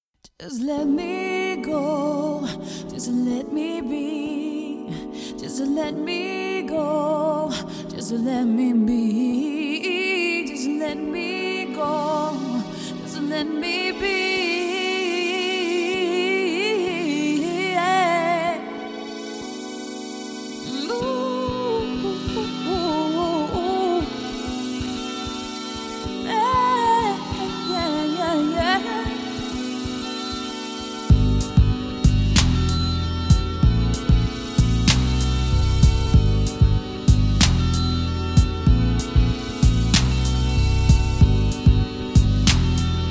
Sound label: vocal chill 432 Hz